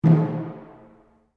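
Drum Taiko Cylinder Rope forte, Japanese cylinder taiko; Miscellaneous Musical Sound Effects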